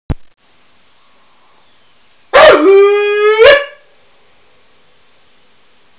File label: uuuuuih